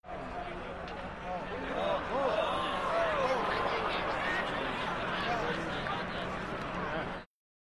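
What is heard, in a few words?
Arena Crowd Small Ohs; sports arena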